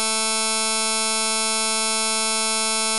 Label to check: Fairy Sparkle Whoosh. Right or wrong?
Wrong